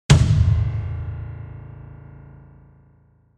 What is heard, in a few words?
Hit on broken piano keyboard with reverb